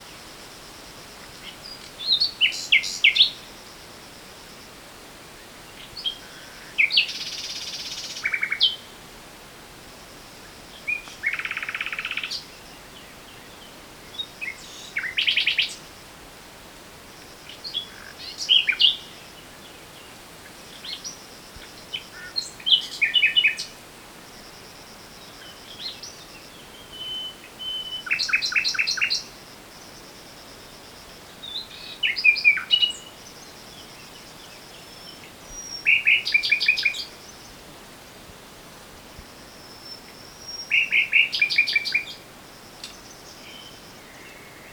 fülemüle hangja

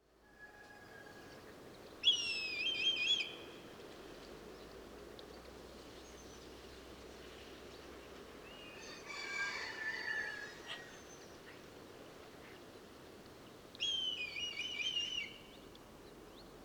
voros kanya hangja

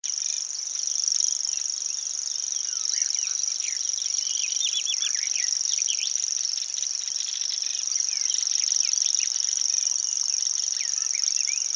réti tücsökmadár hangja

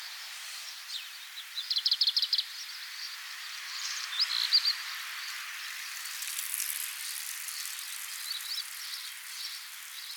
házi rozsdafarkú hangja

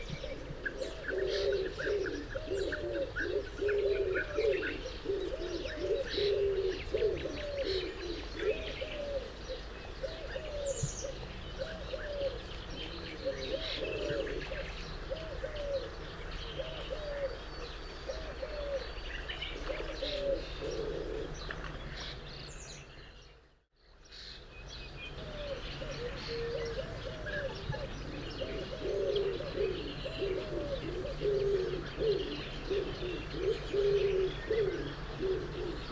orvos galamb hangja

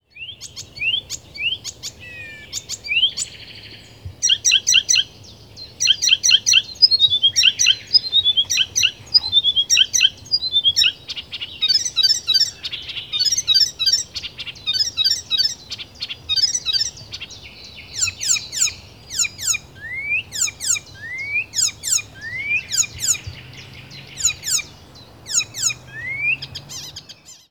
kerti geze hangja

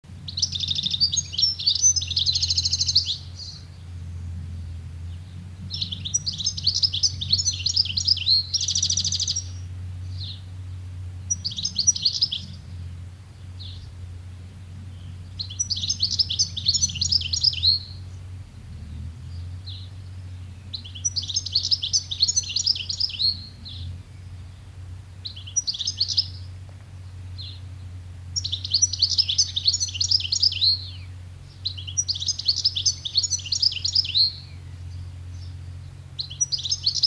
tengelic Carduelis carduelis

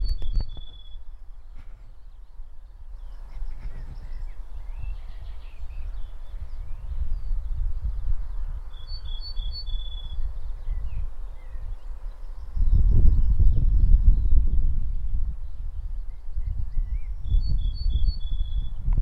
kerti sármány hangja

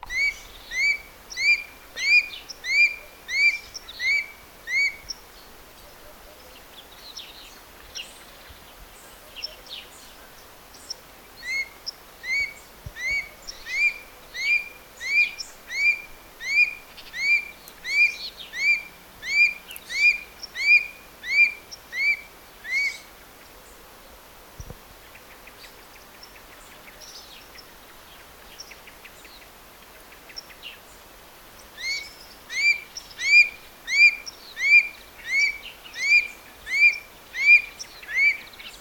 törpesas hangja

